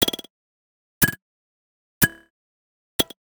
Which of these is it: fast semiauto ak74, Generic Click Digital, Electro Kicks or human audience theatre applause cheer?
Generic Click Digital